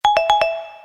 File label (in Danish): android ivrig, ringetone